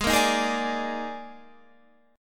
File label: D sharp Major 7th Suspended 4th G sharp